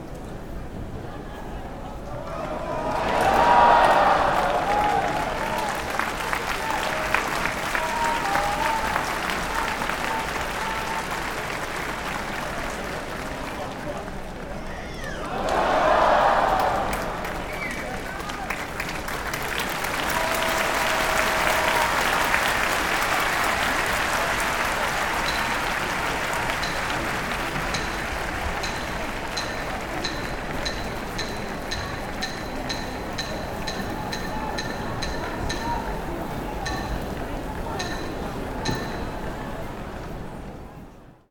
Sumou ending event

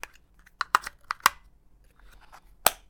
batt into clicker; electric

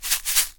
sifting on a rice; eating

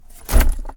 a bag in a car

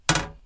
toilet cover O

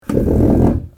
pulling back a chair

